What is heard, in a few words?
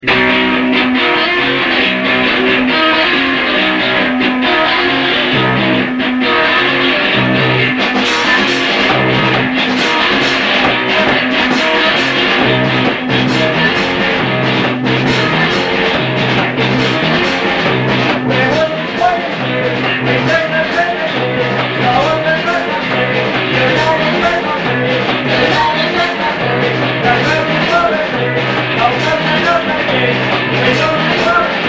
Rehearsal